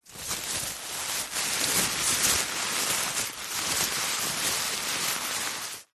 foley paper thin stuffing crumpled handling rustling; zapsplat